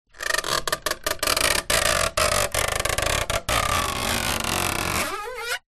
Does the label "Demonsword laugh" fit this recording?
No